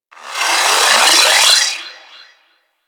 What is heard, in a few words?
Daikyu Bow Charge 3D A; Tenno